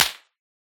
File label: near, whizz; tacz-tweak-pack; taczmechanics